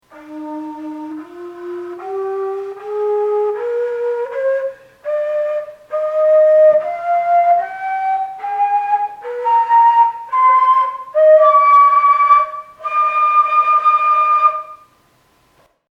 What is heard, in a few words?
flute scale